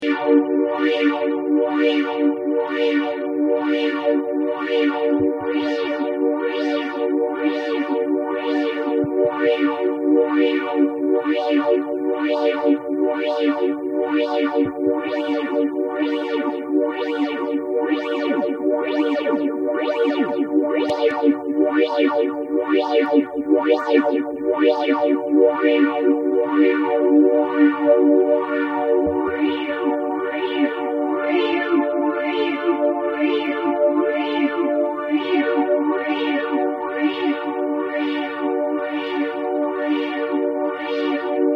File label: Korg N1 4stage 8stage, phase shifter